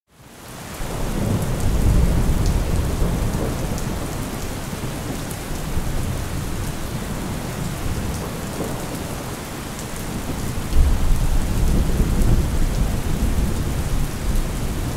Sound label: Rain and Thunderstorm Sounds; For Focus Relaxing and Sleep; 20 Minutes of Rain and Thunderstorm Sounds; Epidemic ASMR